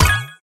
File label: collect; pinball